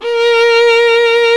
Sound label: SOLO STRINGS